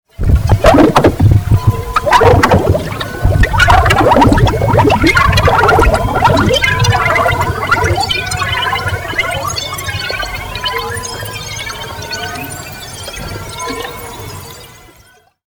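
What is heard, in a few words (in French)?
eau glougloute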